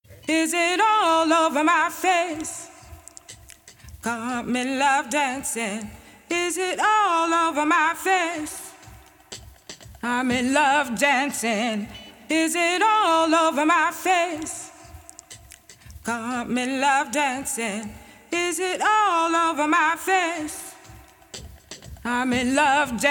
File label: Female Acapella